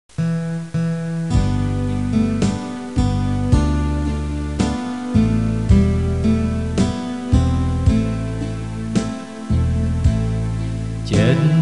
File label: alto